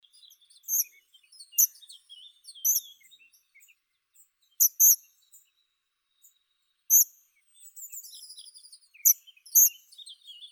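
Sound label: hawfinch, Coccothraustes coccothraustes; dubonos obiknovennii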